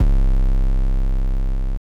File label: Not Nice 808's